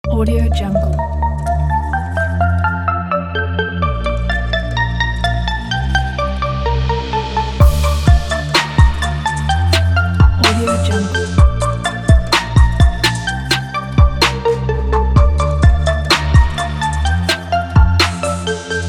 dream trap kit

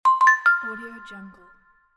alert

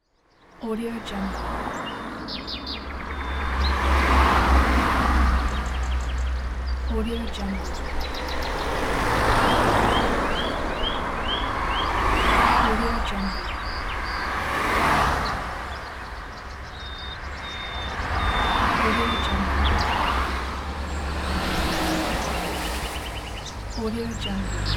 road through the forest

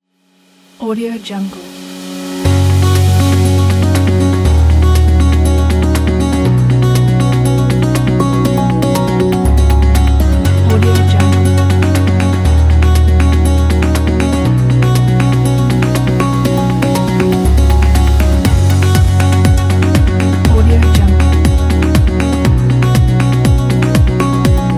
motivational ambient background

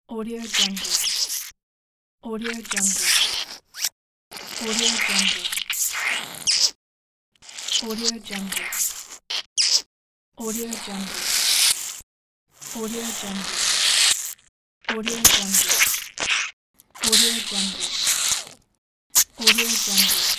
slime

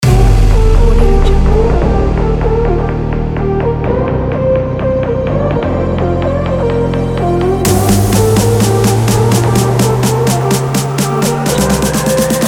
edm sport energetic; abdiash

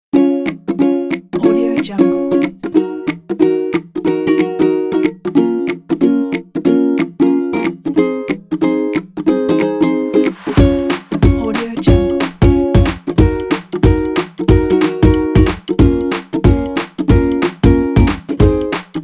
a happy; hitslab

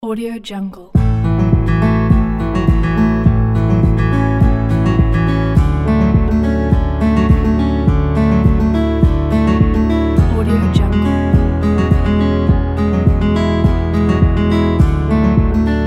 acoustic motivation

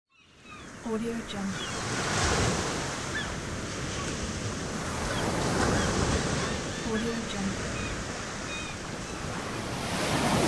beach and seagull

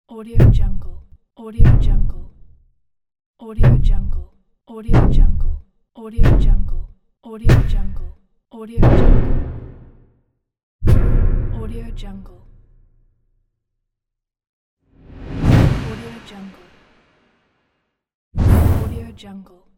thud; volkovsound

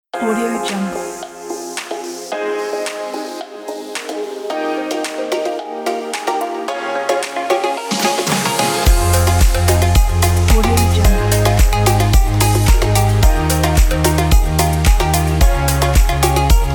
pop dance bright

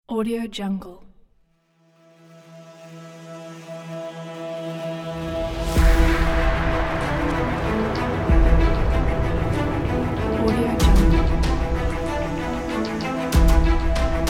night stalker tension cinematic

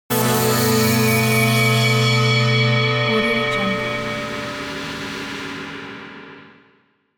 cinematic elements synth slice high

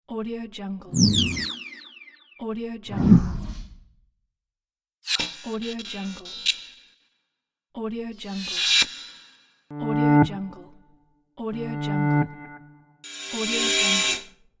transitions pack

